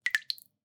Drips

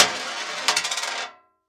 Coin On Metal Tray

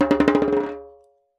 Jembe Bounce; Percussion Samples

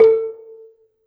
Gambang Soft Beater, Gamelan

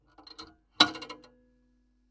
PayPhone

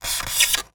Polystyrene Squeak

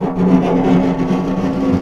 Snare Drum; Percussion Samples

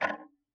Tick, Clocks Kitchen Clock

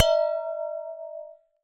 Strike, Vase

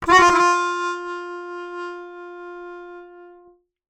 Ornament, Melodica

